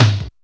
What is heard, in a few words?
IDUSTRIAL; E-MU Producer Series Vol. 2; More Studio Essentials; Composer; Technopourri